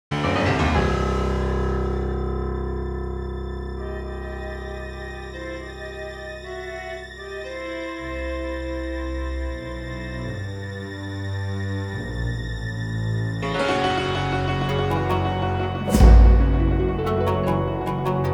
Chinese Ambient

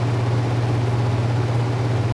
ac fan loop; ambient

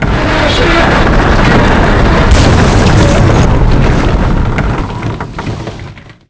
hit to rock; shipcharge; Pirates Of The Caribbean

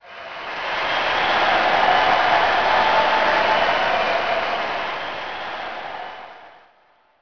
med crowd; MX2002 Featuring Ricky Carmichael

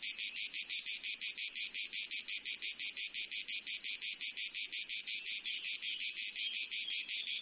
Crickets Loop; Environment; BackgroundLoops

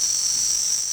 cicada loop, colombia; ambience; Soldier Of Fortune II Double Helix; XBOX

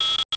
quit request, menus; Soldier Of Fortune II Double Helix; XBOX

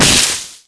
Dry Tree Slam; XBOX; Amped 2

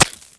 Bullet Stone, Impacts

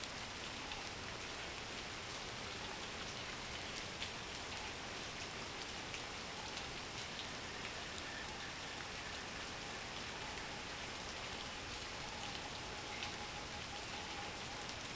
amb swamp Rain; Star Wars Obi Wan; XBOX

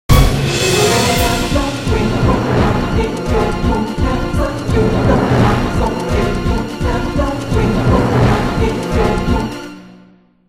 Fight Win; Silas; The Da Vinci Code; XBOX

